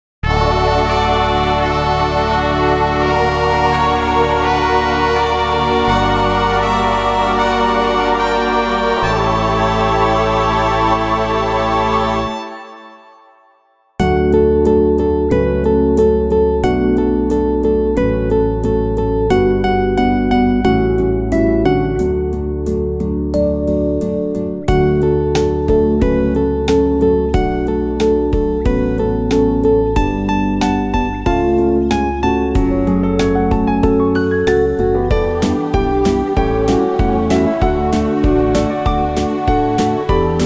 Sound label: bells; Electronic